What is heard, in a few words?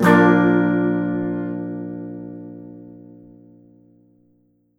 guitar